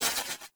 Draw Release; Weapons; Warframe; TheNewWar; Omicrus

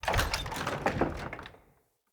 Wood Double Door Open; UnderCity

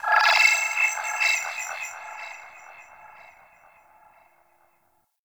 Grain Creep Bird; Warframe; Tau

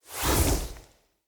Staff Caster Cast Heavy; Weapons; Player; Staves; Starter

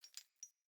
Grunt Foley Movement Chains

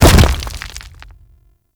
Golem Step Default; Warframe; Footsteps

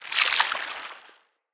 Root Cave Mushroom Squish; Ambience; Props; Neathuns Root Cave

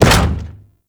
Step Default; Warframe; Corpus; Arachnoid Camper; Steps

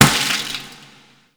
Party Balloon Pop; Gameplay; Warframe; Shadowgrapher

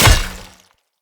Great Sword Impact Flesh; Weapon Impacts; Player; Swords; Combat; Founders Great Sword

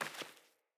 Sandals Walk Vegetation; Player; Footsteps

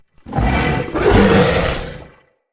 Corrupted Battle Dog Attack Jump

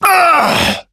Struggle Short; New War; Ballas

